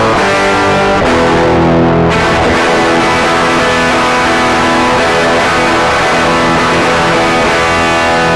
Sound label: Finale, Distortion Experiment